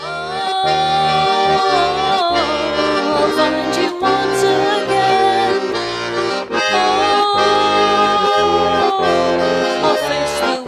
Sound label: accordion